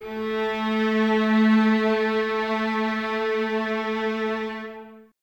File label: VLS A 3, Violas; Miroslav Vitous; VLS 1+2